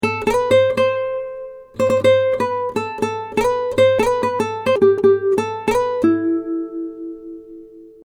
pop lead

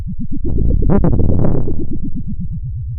pop, Sound of a fire crackling

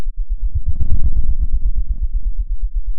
pop, Sound of a dinosaur

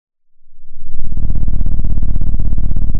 pop, Sound of an engine revving

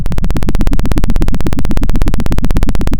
Sound of a helicopter

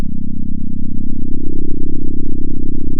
center, sound of a small motorcycle

